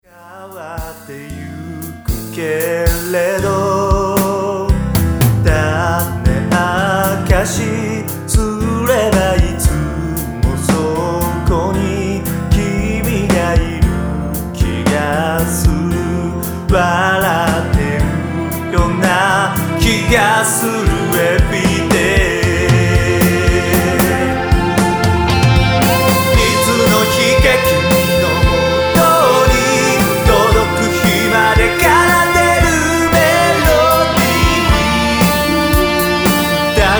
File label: melody